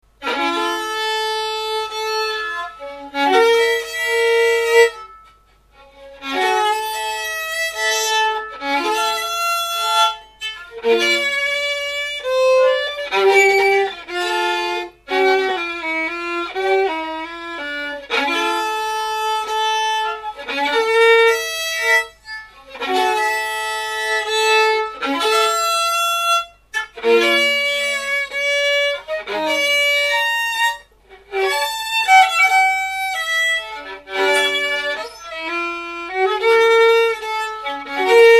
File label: ciaccona, violin; adult violin